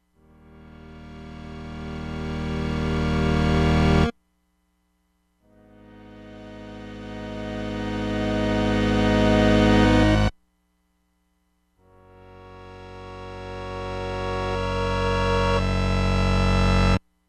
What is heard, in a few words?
BACKWARDS PIANO, Crumar Bit 99; Factory Programs